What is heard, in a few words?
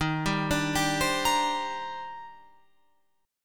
D sharp Major 13th D sharp Guitar Open F, chord; D#M13; arpeggiated